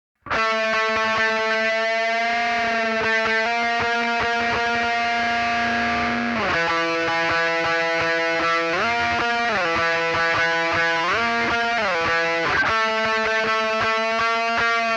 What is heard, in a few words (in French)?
guitare solo